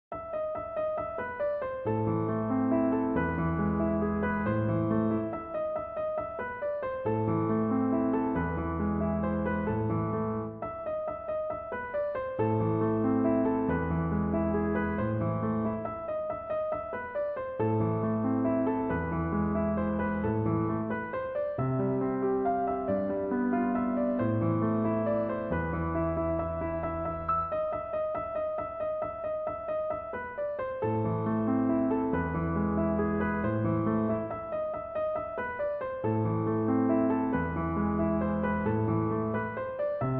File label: Classical Piano For Baby; rain